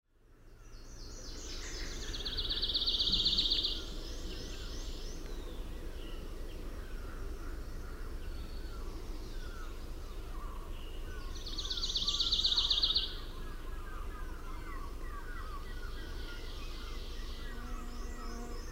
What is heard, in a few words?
Pine Warbler